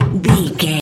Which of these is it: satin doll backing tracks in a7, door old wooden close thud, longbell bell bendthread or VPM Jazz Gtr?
door old wooden close thud